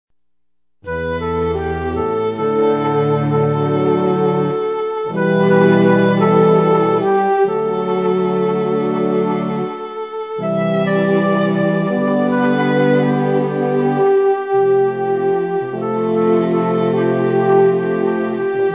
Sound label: Horn Viola Flute